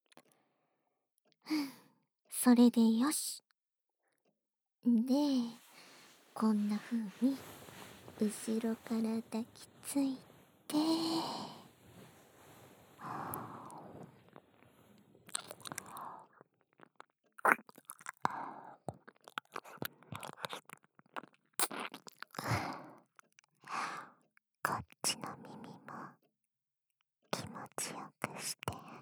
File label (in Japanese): 耳なめ 右; お屋敷づとめ。Vol.5―一之瀬梨蘭 リラクゼーション岩盤浴―